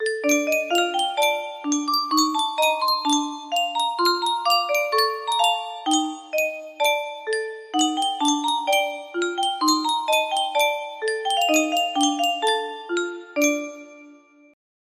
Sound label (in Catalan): Yunsheng caixa de musica